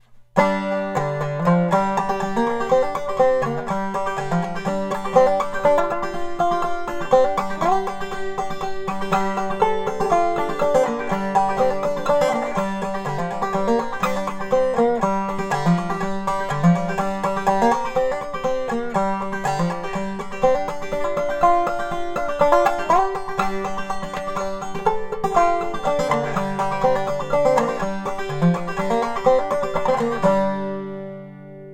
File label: Rich tone. Lots of presence. Balanced and warm., banjo; Body: Curly Walnut; Internal Resonator: Partial